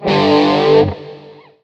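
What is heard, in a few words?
metal Short Power chord G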